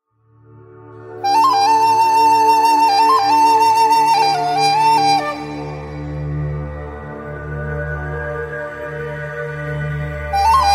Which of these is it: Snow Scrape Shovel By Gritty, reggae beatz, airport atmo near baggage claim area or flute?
flute